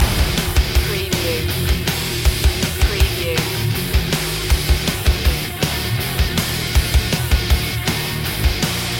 firestorm loop

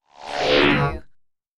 drone slide beam

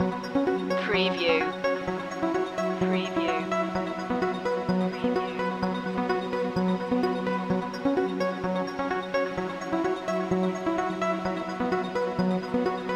time piece loop

